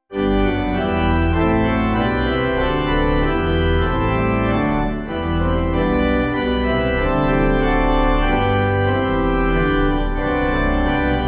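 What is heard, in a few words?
Pipe Organ; Wolvercote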